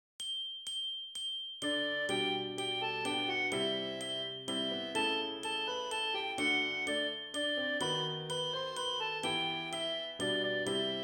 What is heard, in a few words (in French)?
piano avec pulsations rytmiques